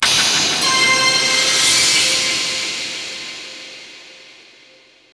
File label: Str G#; Pro Yakyuu Spirits 3 (PS2) (gamerip) (2006)